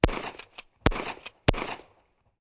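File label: rifle shots; Media-Pedia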